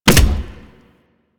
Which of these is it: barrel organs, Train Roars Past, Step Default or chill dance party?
Step Default